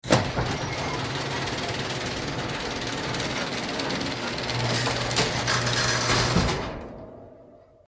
gate

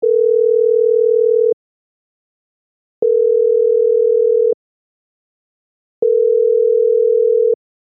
telephone calling sender

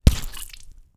wet, headshot

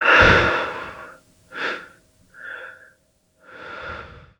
die, hospital

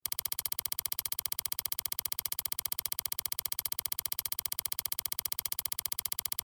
fortune roulete

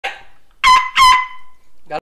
horns; dilera

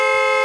horns

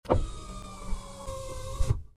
car window

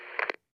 bip off, radinho

